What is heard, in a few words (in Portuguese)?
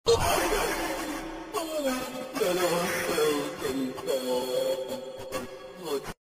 chorando; expunged